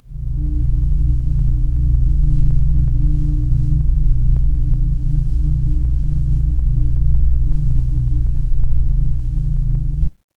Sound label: ambience room tone